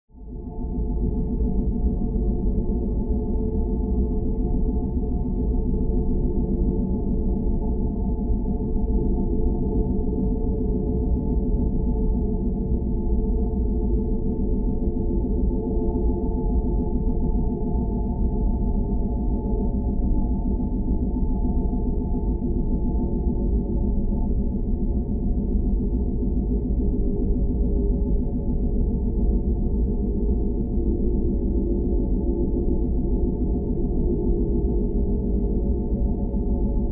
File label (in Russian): shum kosmicheskogo korablja